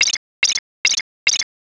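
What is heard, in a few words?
footsteps bush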